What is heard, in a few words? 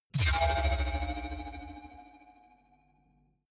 sci-fi magnetic sling big high; energie